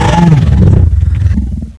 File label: hide hurt, zombie; Zombie Darkness; hidden